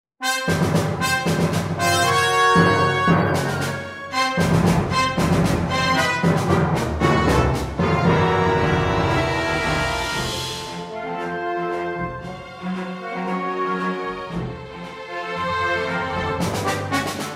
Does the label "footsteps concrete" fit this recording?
No